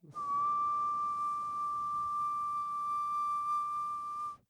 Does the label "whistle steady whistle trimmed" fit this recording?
Yes